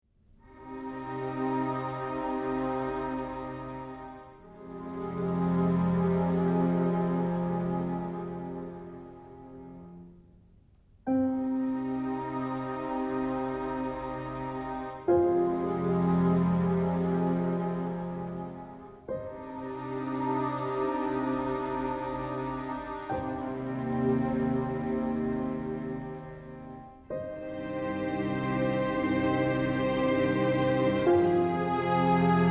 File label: Silent Lament slow without melody